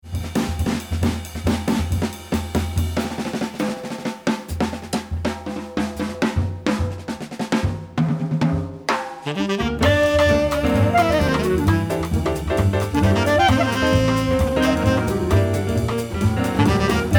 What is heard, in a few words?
Groovy Samba